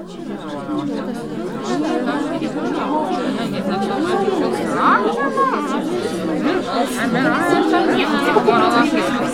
noise, classic vox; Best Service; Hallelujah

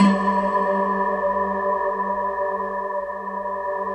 SPCJNK C3; Rubber Chicken Software Sample Bank

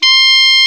KLARINET, Alpine Volksmusik; Best Service

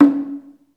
DRUM, Ritual Drums; E-MU Producer Series Vol. 7 – Old World Instruments; Drums&Percussion

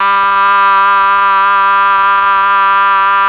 OBOE; ILIO; Propeller Island Legendary M400

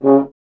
F. Horn; Miroslav Vitous; Solo Instruments VOL-2

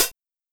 Linn, LinnLinn Drums

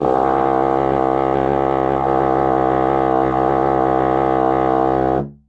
c1, RAG DUN TENOR HORN; World Instruments 2; WOODWIND AND BRASS

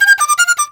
CHINA, Heart of Asia; Spectrasonics; CHINAFLT

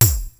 TEKNO; Rubber Chicken Software Sample Bank

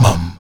VOX MUM E 2A, Afro Chants, Stacc Mums; Roland LCDP11 Africa VOL-1

